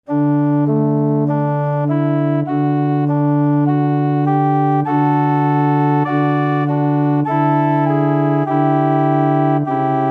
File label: ave, organ